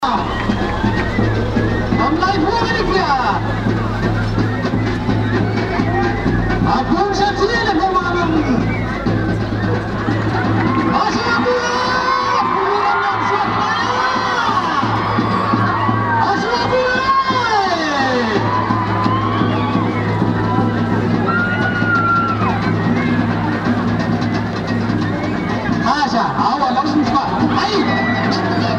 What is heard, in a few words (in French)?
la cérémonie commence premier combat